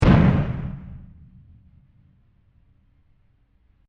Oil Drum Explosion Impact Concussion Metallic, Lid Blown Off; Explosions; Blast